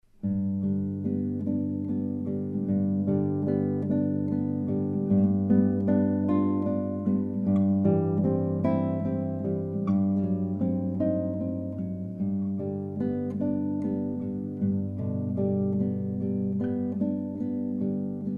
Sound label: Solo Guitar Ave Maria